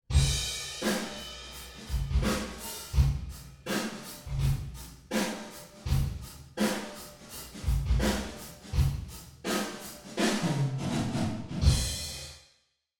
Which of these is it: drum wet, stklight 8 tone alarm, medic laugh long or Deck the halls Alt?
drum wet